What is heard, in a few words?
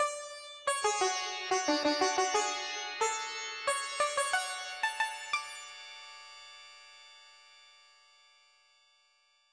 sitar